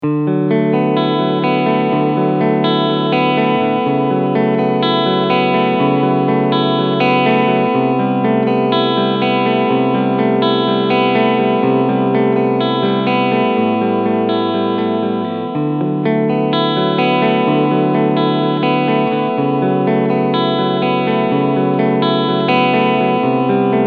Cleansound beide PUs

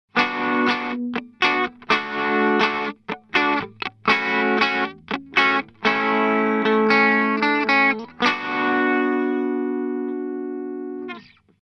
Korg NuTekt TR S Bright mit viel SAG Single Coil

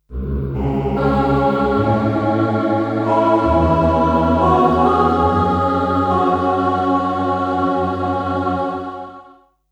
Chorpatch

